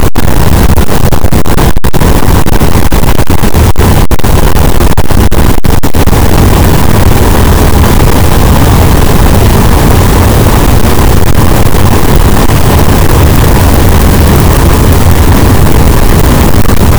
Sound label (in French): cachalot, Physeter Macrocephalus, gaussian noise